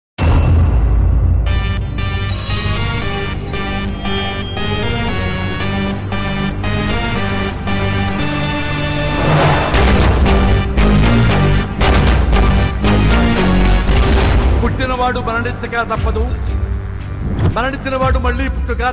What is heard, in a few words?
Instrumental; Nene Raju Nene Mantri